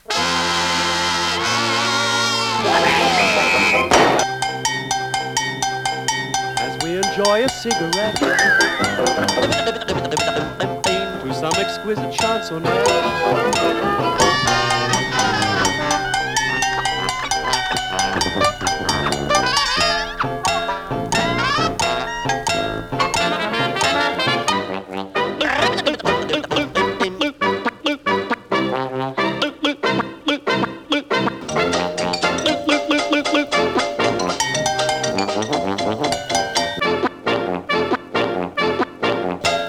More Cowbell

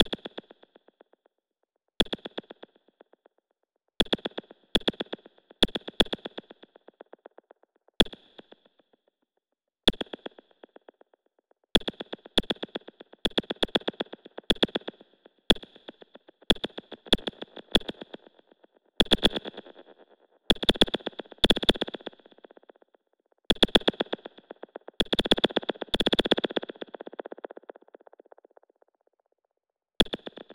Minimal

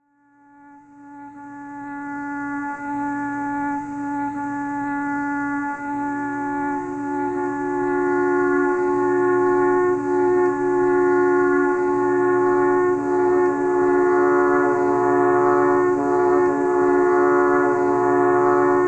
joyful noise

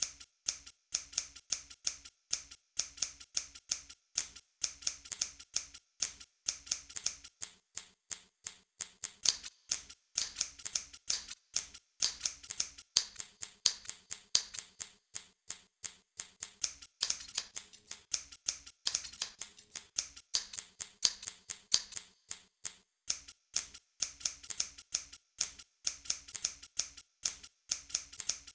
cutting

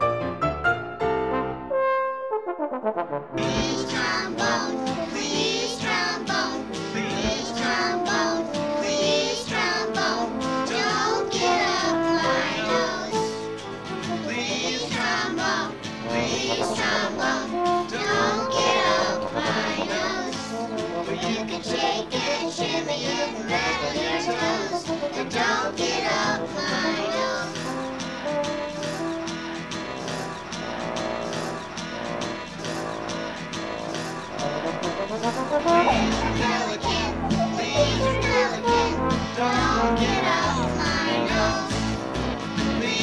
Trombone

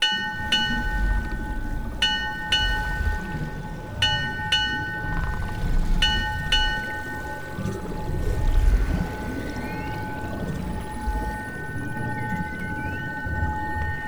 Whales & Bells

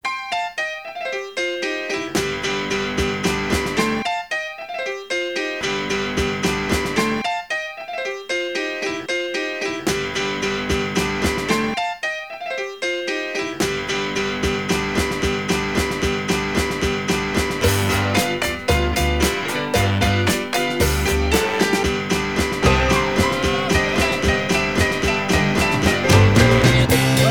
Rockin Piano

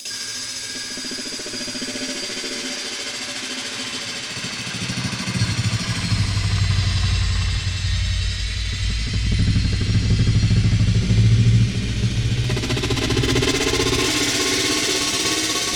Sigh

